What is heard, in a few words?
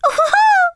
Laugh; Target Hungryfrogs; Fir Emote Laugh